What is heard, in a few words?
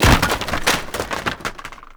Wood Crate Destroy; Warframe; NineteenNinetyNine